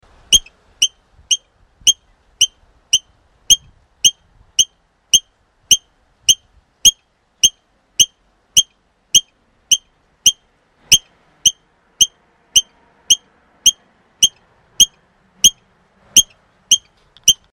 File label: squirrel